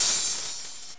Hi-Hat Open; GMPlay; XG

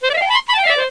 snake; Santa's Workshop - Advent Calendar for Computers

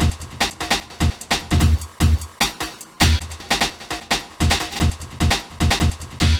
drum; Evolution

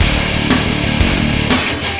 red alert; TACTICAL2

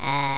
on Popup; PC Gamer IT CD 41; Mplayer; daikatana

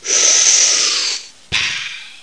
Bubble Pop; Museum of Anything Goes

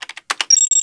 CONSOLE; Messiah; TRIGGERS

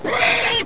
BOAR HIT; landslor

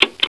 click

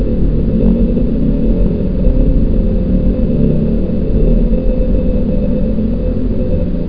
Grinder; Museum of Anything Goes

